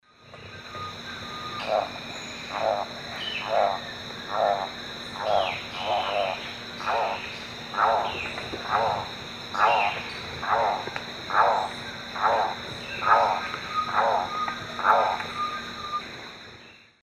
Selenidera reinwardtii, songs 2 birds; S. America; Eastern Lowlands

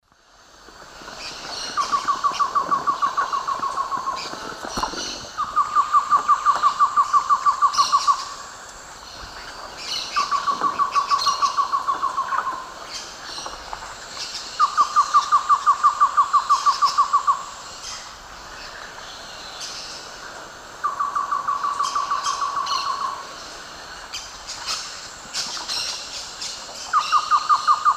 Thamnophilus praecox, songs 2 pairs; S. America; Eastern Lowlands

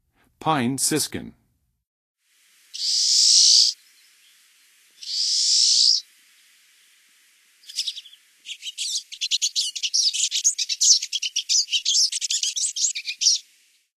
Pine Siskin, Songs of Birds of North America; Western USA; Western USA Songbirds

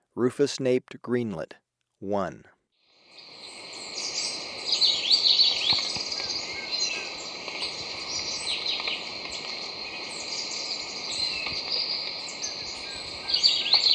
Rufous-naped Greenlet; S. America

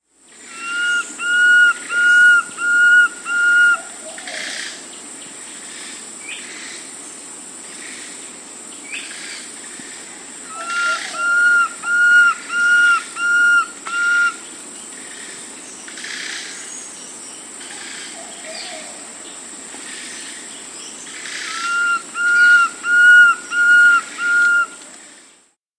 Fasciated Antshrike, Nature's Songs, S. America

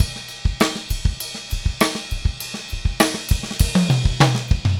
Funkified, Rock Drums

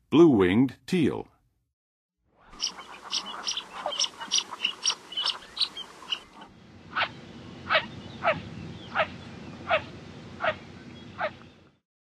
Blue-winged Teal; North America; Western USA; Western USA Songbirds

